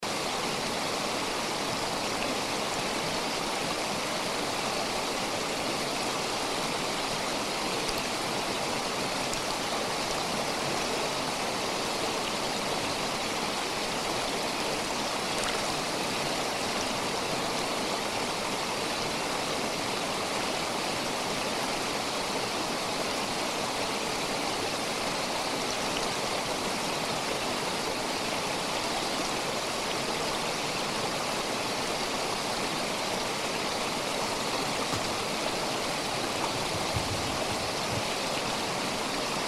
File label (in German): rissbach rauschen; hinterriss